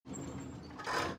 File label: hiss